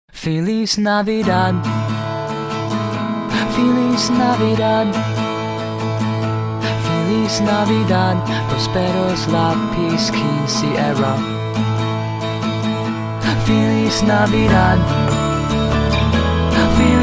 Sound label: Pop Rock